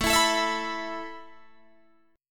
A Suspended 2nd A Guitar Open D

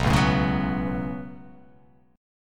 B 7th Suspended 2nd; B7sus2; strummed; JGuitar

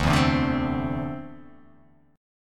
D 7th Suspended 2nd down, chord; D7sus2 strummed

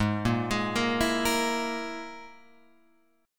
Gsharp Suspended 2nd Sharp 5th, Guitar, Open F; arpeggiated; 8,5,8,5,7,5

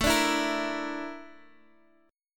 Bb Major 7th Flat 5th A

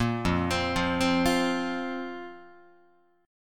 F Suspended 4th Guitar, chord; Fsus4 arpeggiated; 1,1,3,3,4,4; JGuitar

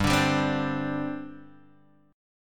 G Suspended 4th, Guitar, Open G

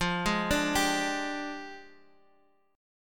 F Minor 6th Add 9th, Guitar Half Step Down

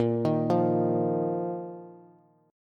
Bb Suspended 4th Bb down Bass; Bbsus4 arpeggiated

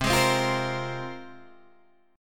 C 7th Suspended 4th Sharp 5th, Guitar, DADGAD

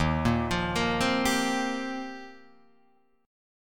Eb 6th Add 9th, Guitar, Drop D

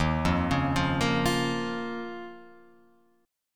D sharp 7th Suspended 2nd, Guitar, Open F; D#7sus2 arpeggiated; JGuitar

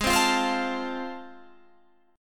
A Minor Major 7th Sharp 5th, G sharp

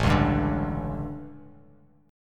A 11th A down, chord; strummed

